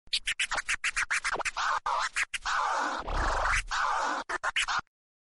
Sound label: record scratch sound